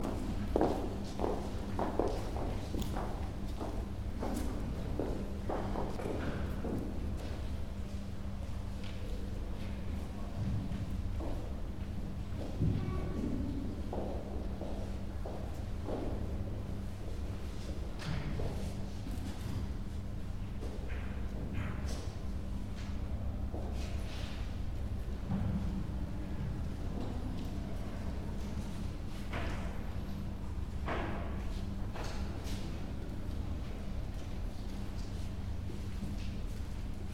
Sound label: people entering church before mass is starting